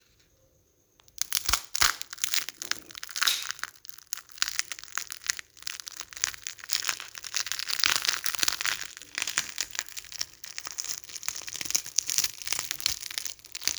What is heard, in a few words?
Egg Hatching